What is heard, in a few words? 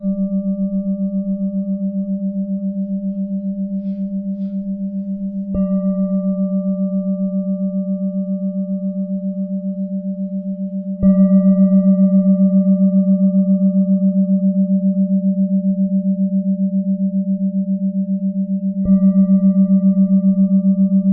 washed flat bowl